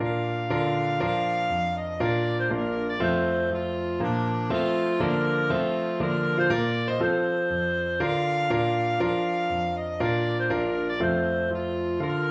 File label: clarke tpt tune clarinet